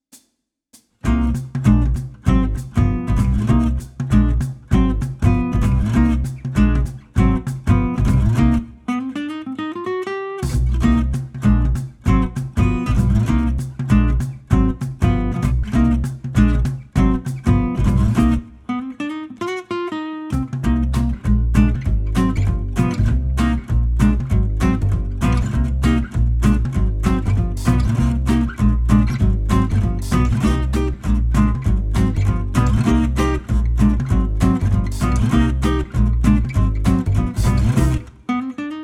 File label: Easy Jazz without finger snap